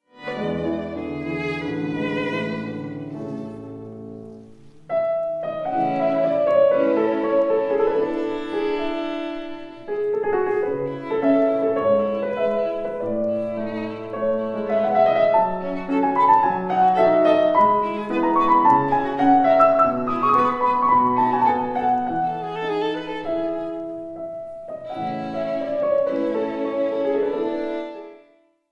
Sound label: violin sonata in D major; ludwig van beethoven; op 12 no 1